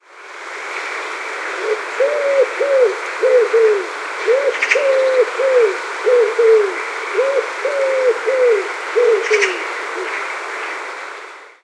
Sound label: Columba palumbus birdsong